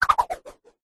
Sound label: whoosh swoosh throw spin air; zapsplat